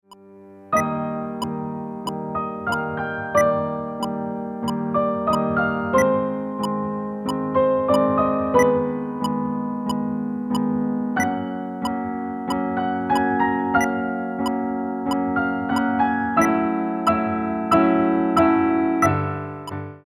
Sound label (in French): Piano Fantaisie avec metronome; Novo